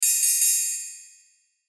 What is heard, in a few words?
Hit Chimes Multiple High; Production Element Imaging Element Accent Transition; Blastwave